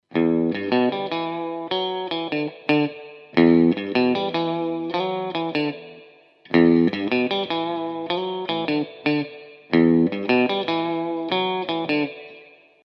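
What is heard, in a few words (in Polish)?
gitara elektryczna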